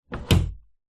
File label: furniture wardrobe door close wooden